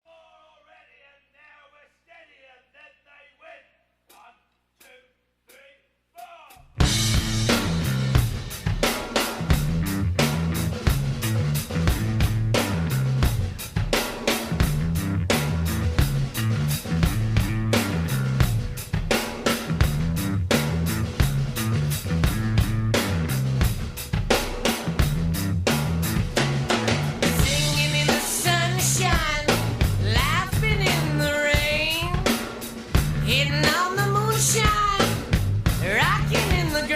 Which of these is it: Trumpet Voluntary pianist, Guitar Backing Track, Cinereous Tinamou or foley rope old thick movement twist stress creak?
Guitar Backing Track